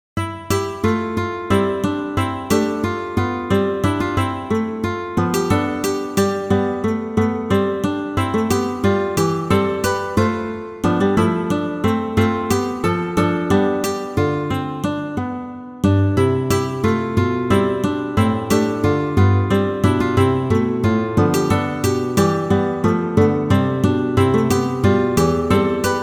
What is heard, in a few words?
contrapontic study; avec tablature